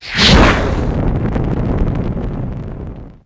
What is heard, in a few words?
Gasoline Trail Burns; Max Payne; restaurant